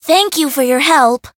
healed

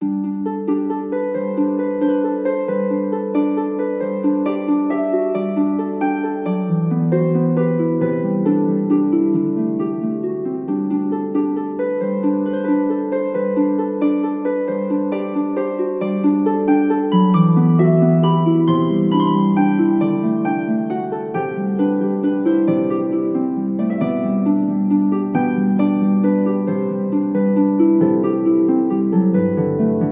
Bgm town coal mine

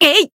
attack, jp; mighty

